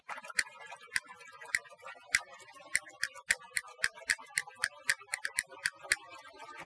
Castanets Violin Applause

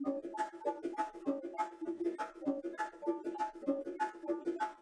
Bongo

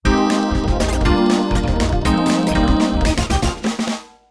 Pop; TSMtoolbox

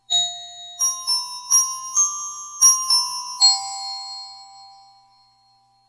Glockenspiel; TSMtoolbox

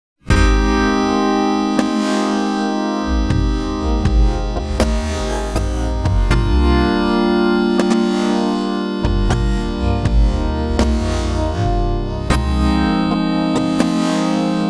Pop

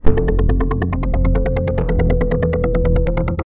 Synth Poly

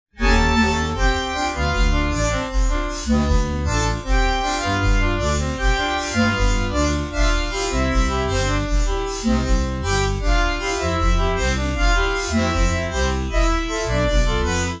Jazz

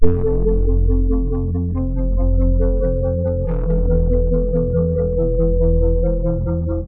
Synth Poly

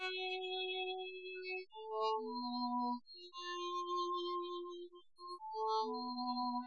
Castanets Violin Applause

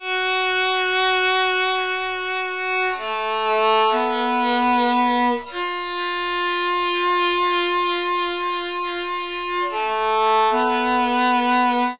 Castanets Violin

